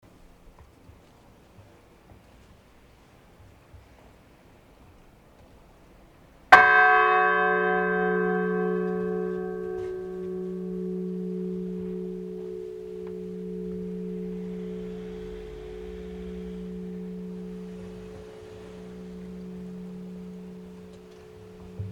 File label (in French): cloche; Dijon